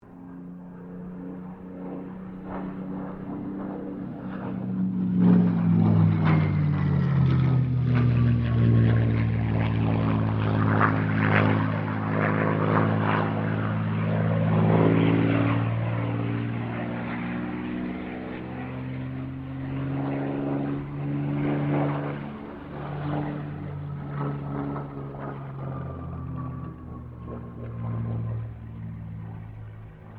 AIRCRAFT SUPERMARINE SPITFIRE MK-XVI PASS

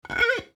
Finger Squeak, Finger Squeak On Ceramic Plate; Squeaks

